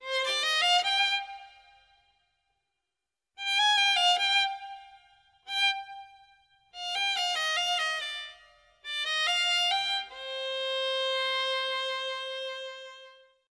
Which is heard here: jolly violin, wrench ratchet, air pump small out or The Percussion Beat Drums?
jolly violin